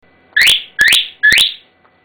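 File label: That`ll Do, whistle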